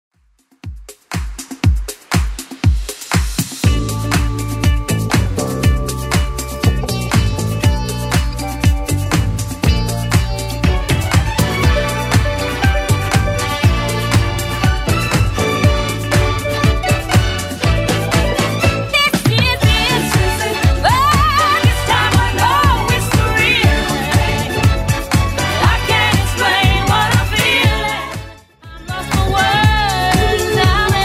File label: 80s Club Edit 120bpm